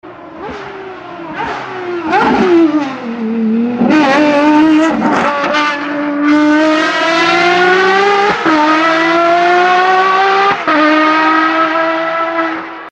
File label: Ferrari Fxx